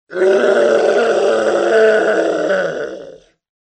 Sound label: yell